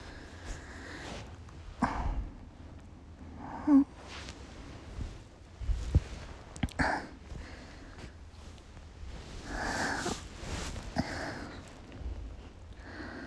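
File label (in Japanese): 現役JD おなにー, 演技なしオナニー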